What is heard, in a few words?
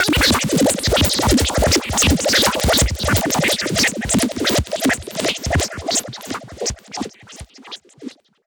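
alarm siren, modulation processed, 170bpm; musicradar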